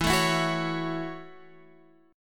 F sharp Minor 7th

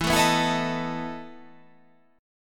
E Major 7th Suspended 4th

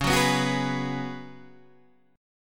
C sharp 7th Suspended 4th C sharp, Guitar Open D

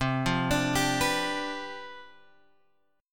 C Major 7th Suspended 2nd Suspended 4th C, chord; CM7sus2sus4 Chord {8 8 X 7 8 7} arpeggiated

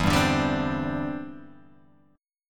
E Minor 7th Sharp 5th, down, Guitar, Open G

